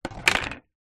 foley plastic thin square panel plate down on wooden surface; zapsplat